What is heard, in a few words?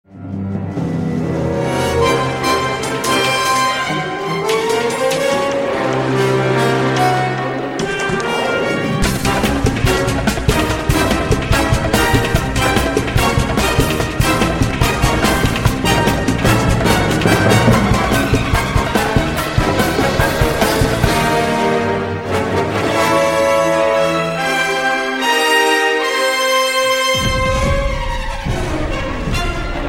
Action Jeopardy; Agent Cody Banks II